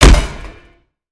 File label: Spider Get Hit; creatures mechanical; Lego